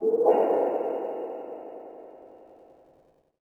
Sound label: nav signal far